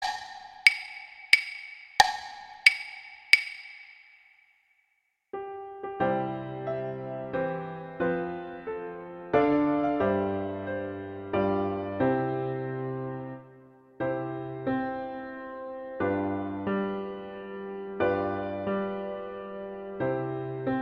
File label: Happy Birthday acc